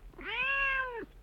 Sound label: Cat moan